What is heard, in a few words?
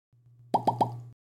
drop items